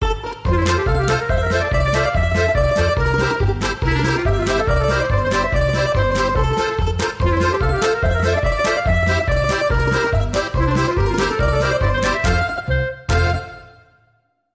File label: Russian Polka